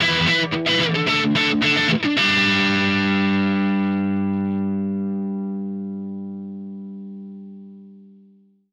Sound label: Guitar Ending, Indie Rock; Sonic Foundry (Sony Creative Software); Indie Rock Anthems; Session 01 - Brighton